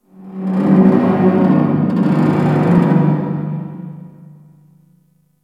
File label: Infested Distant Metal Groan, Ambience; Warframe; Corpus Infested